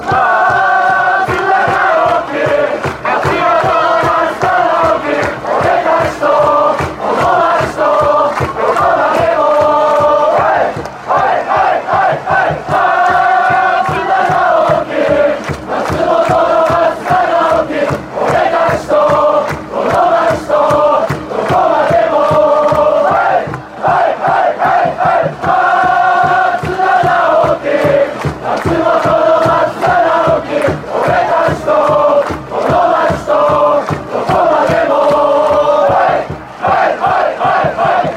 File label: song, fan chants; matsumoto yamaga